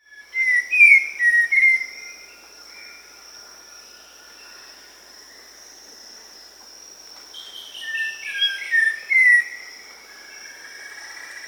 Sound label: Red-crowned Ant-Tanager (Habia rubica), Peru; S. America